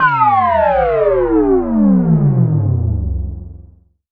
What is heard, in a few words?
FX Downlifter